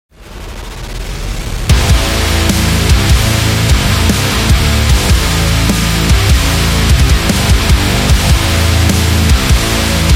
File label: This Metal